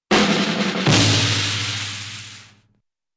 short drum roll and impact crash

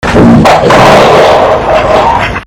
fart

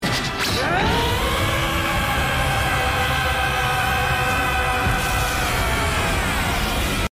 roar japanese; goku ui

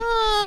whine

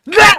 aaaaah; krabby

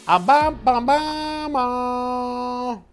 horn

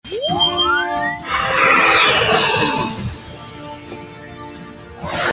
sword skill; sao

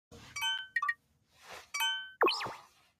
duel application tones; sao

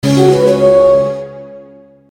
pigs out win; angry birds

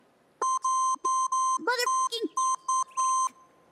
beep curse

